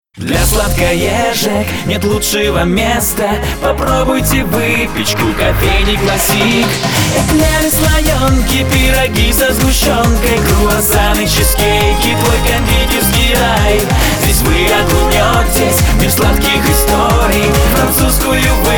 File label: Cafe Classic, vocal